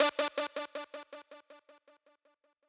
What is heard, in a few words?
lead